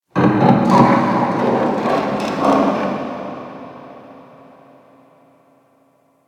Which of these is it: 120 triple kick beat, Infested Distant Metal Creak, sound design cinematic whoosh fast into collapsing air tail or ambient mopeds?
Infested Distant Metal Creak